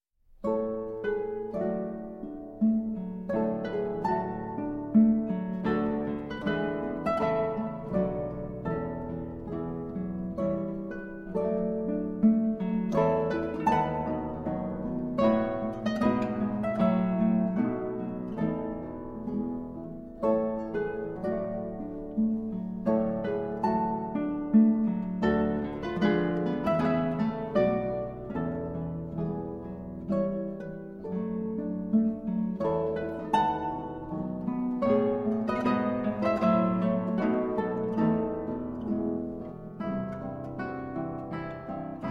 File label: Intermezzo, Arr. For Guitar Quartet; Brahms; Op. 118 No. 2